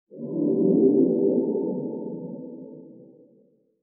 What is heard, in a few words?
magic organic flutter ethereal; a dump in the dark